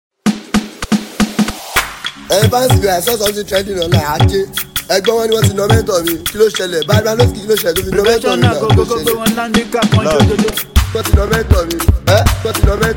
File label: Professional Beat